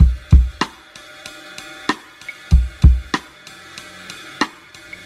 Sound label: nearly full beat; Drum loops